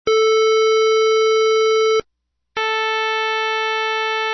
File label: square